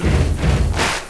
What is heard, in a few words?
stomp stomp clap